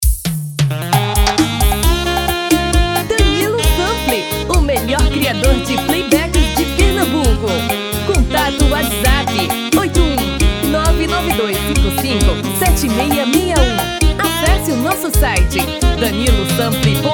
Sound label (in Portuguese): SERESTA Na Radiola masculino